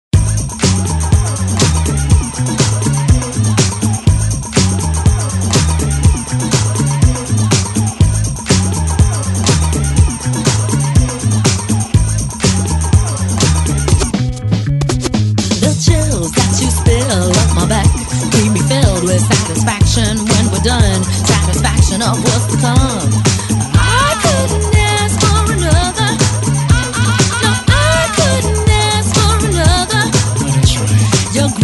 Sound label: 90s DJ Intro Outro 122 Bpm; DJDOLLS